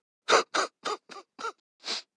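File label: crying; El Rey Arturo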